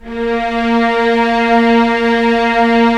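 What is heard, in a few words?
STR String Section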